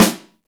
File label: snare, Roland; Club-50 - Foundations Roland; Ext.Snare